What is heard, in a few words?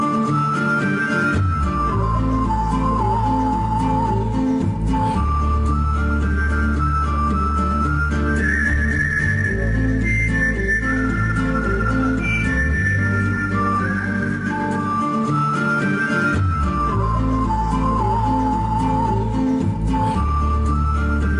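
Flute Music In Bm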